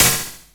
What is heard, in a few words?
SILVER SPLASH; Best Service Dream Experience